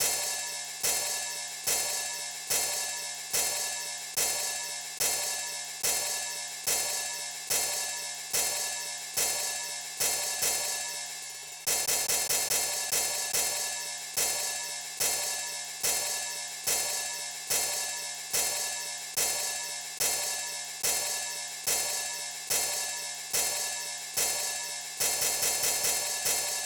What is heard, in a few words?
hat open, Drum Tracks, 072 G#min